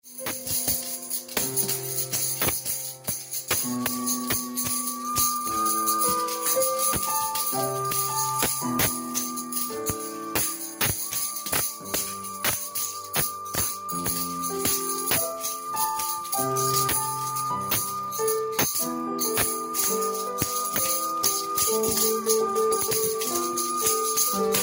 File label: pianoconcert